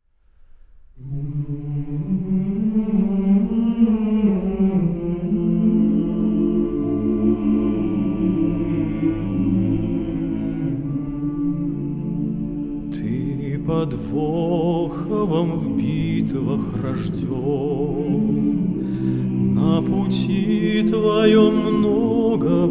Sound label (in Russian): Хор Валаамского монастыря